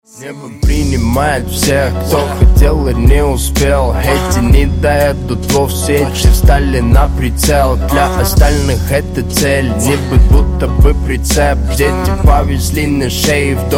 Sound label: loud pretty scream